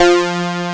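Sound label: poly pad ambient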